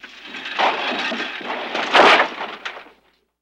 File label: Car Bottoms Out Over